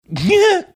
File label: funny giggle